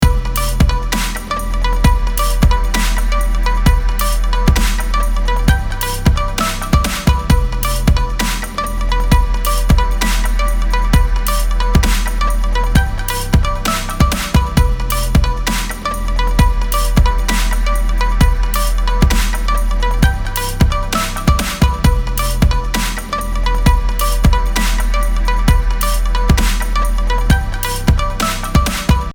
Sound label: Dubstep Loop